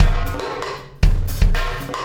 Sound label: Wormhole Beat, Processed Drumkits